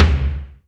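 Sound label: GATE tom; Crypton; DrumBase5000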